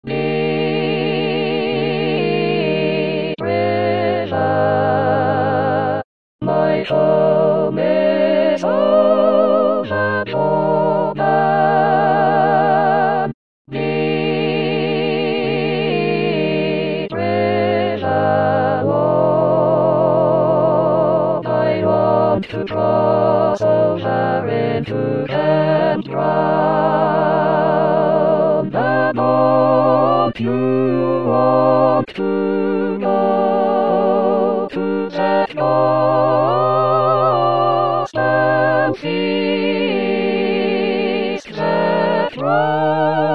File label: Tutti; Deep river